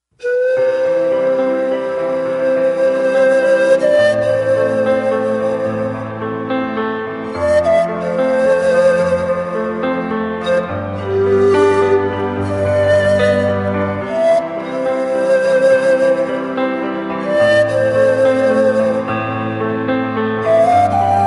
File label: Ska